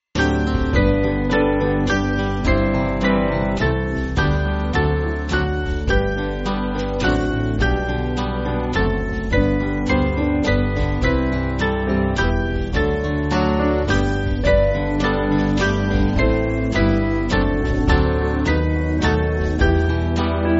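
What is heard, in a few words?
Band, Backing Files for Churches; O Jesus Crucified; Intercession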